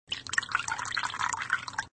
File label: Watering Can; Sesame Street Seasons